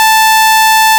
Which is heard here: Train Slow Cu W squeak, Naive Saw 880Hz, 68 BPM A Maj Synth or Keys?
Naive Saw 880Hz